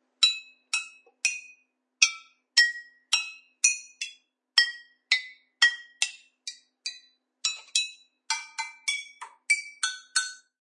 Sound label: Playing some bottles.; Musical